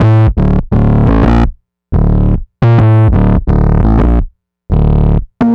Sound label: 173 Bass, Drum n Bass